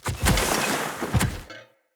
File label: Corrupted Battle Dog Charge Attack Foley